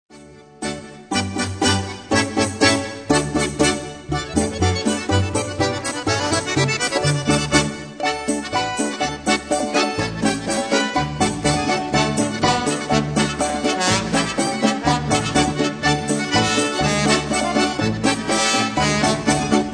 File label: instrumental